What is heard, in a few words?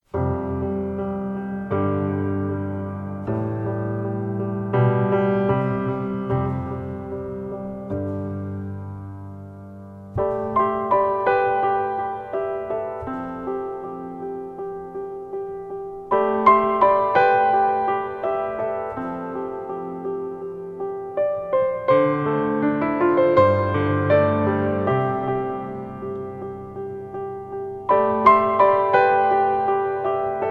Piano Nocturn